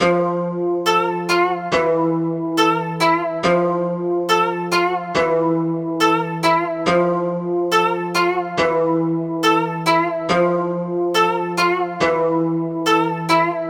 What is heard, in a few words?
Koto Loop Am 140